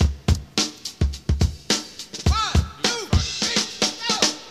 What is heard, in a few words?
106 bpm breakbeat sample c sharp key